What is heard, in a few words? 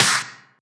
Claps; Vengeance; Essential Clubsounds Vol.4